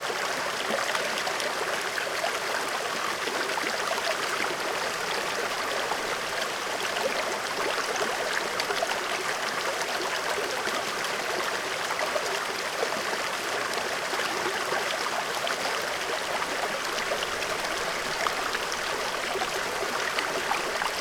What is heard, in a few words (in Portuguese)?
Parque terra viva perto de corredeira pequena; agua